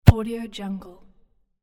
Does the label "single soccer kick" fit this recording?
Yes